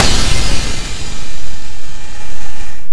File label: Cymbal, A4; Guitar Rock Tour